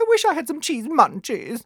LAUGH; The Wild Thornberrys Movie; DARWIN

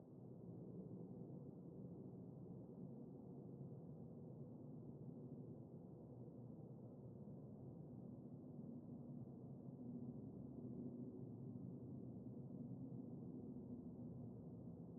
Desert Interior

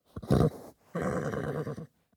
horse grunt

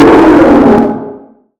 Jumpscare Noentry

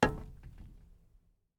glass land; teardown ps5 windows xbox series xs gamerip 2022

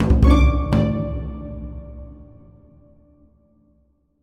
card trick; Armello The Bandit Clan

